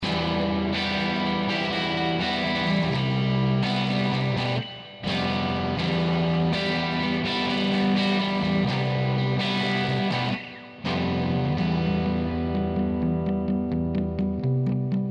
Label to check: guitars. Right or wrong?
Right